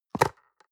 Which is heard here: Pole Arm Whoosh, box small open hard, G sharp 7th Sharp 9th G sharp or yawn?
box small open hard